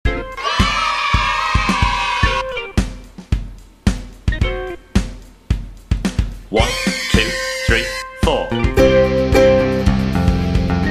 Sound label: Rock those chords, ukulele